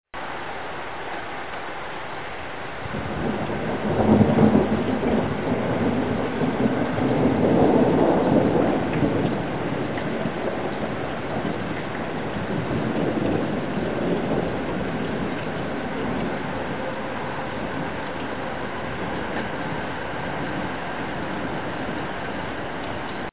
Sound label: thunder rain; winter lightning and thunder